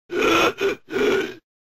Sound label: Monster SFX